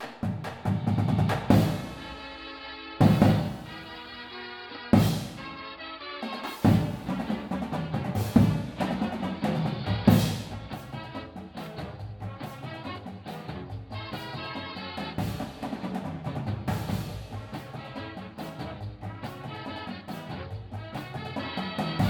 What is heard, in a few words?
full drumline w music